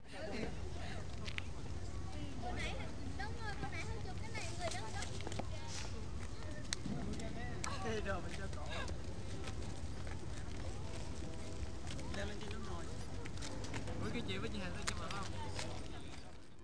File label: entrance, Soundwalk